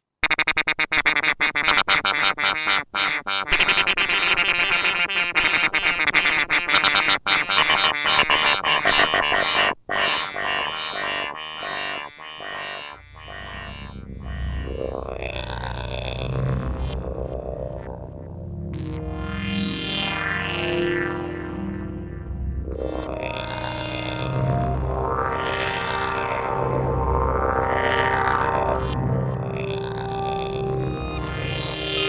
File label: Modulation FX; FredsLab